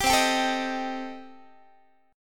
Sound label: C Minor 6th C Guitar; Cm6 strummed; 22,20,22,19,19,x